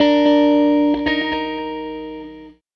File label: Strat Guitar Loop; Sonic Foundry; Six-String Orchestra